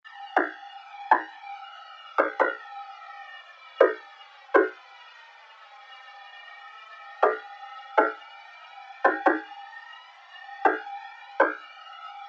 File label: Perc FX Loop 140 BPM; Start; revealed fx loops vol 2